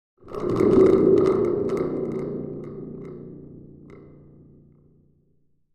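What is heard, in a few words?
Spring Drum Small Shake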